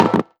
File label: error notification pop in; osu